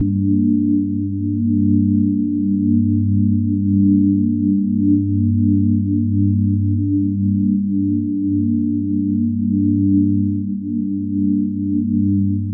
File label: Atmos G2, Club Techno; Zero-G